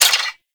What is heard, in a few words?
Mech Add A; Velox Prime; Protea SMG